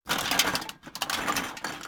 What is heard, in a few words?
Scraps